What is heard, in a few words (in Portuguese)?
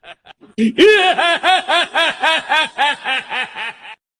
risada; doflamingo; Anime & Manga